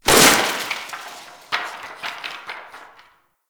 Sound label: Desktop Book Destroy; Warframe; Ambience; Entrati